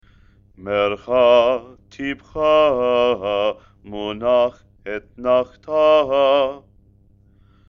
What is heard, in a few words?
haftarah, trope